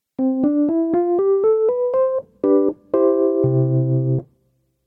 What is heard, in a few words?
Wurlitzer 200A